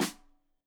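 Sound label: Snare Stereo, Drums; Deeply Sampled Drums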